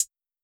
Hat, Closed Hats

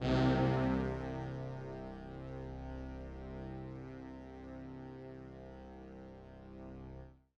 Brass

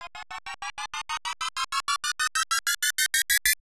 riser

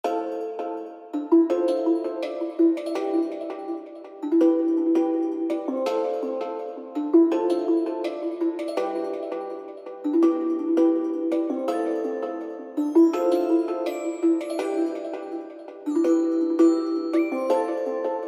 165 bpm

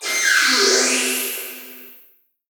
SFX

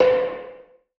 Percussion Shot; Percs; Cymatics; Astro Wake

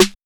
Snare

